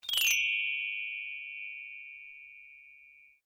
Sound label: XYLOPHONE SMALL STRUM DOWN, Tiny toy xylophone; Mallets (Sound Effects:Musical)